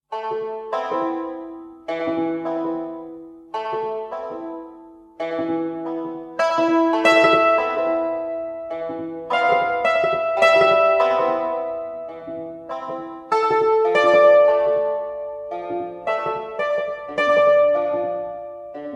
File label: cymbal melancolique; margineanu